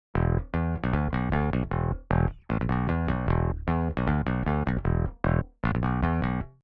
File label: bass off